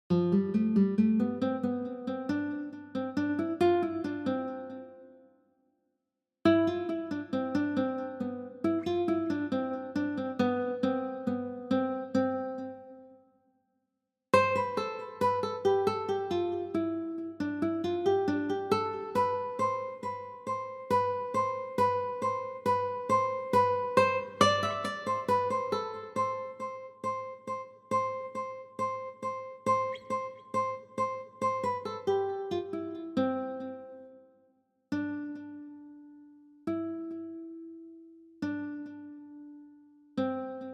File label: Three Guitars Guitar Solo